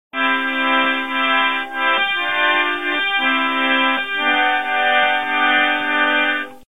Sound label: Harmonium; Orgel